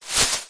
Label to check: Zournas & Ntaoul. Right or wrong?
Wrong